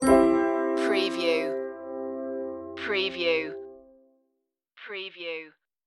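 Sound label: stinger, Acoustic Country / Folk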